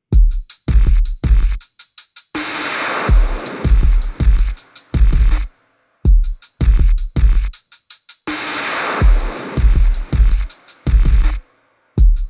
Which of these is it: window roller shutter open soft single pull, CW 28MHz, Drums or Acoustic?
Drums